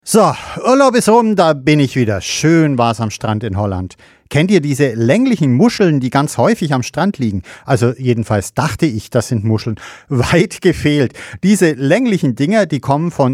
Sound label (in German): gong; Heiko Kuschel